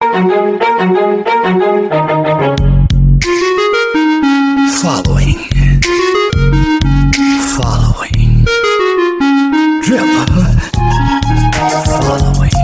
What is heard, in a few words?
ringtone